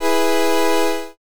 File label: brass